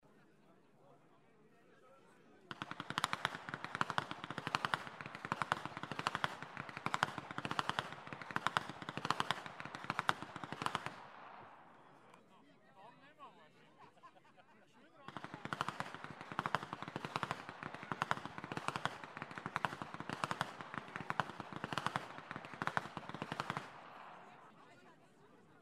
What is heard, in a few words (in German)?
schnalzen, flachgau; BERGHEIM II; Allgemeine Passen